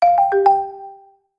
marimba, notifications

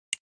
Wheel Picker; Oppo A9 2020

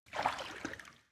ocean flicking; LG G Flex

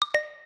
clear, notifications; OnePlus OxygenOS

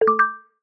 Wireless Charging Started; HMD Pulse

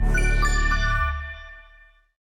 Power Off

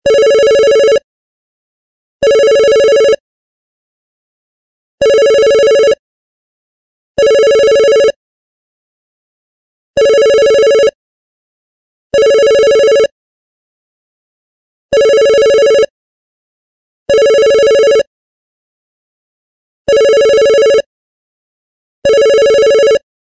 Vintage Bell, ringtones; LG Stylo 4 Plus

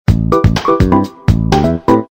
power on rhythm; LG RD6700

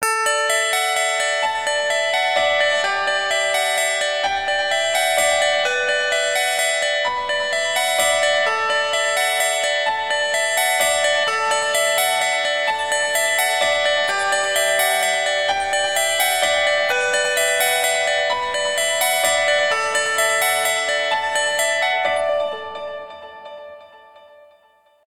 alarms; LG Lancet VS820; Dawning Sky

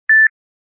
Beep; Nokia; 603

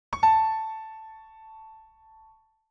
Keys; Palm PVG100

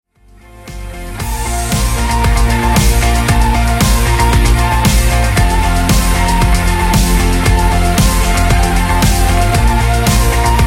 Tone; Movistar

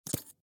camera click; Yota; Yotaphone-2

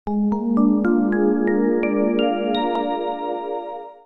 boot sound; Plus GSM Poland

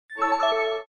close; LG; SU780 Lollipop 3

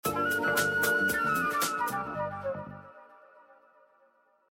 Message tone; Samsung; GT-B7702

